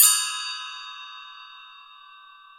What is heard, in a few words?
BELLTREE; Best Service Advanced Media Trax Modern Composer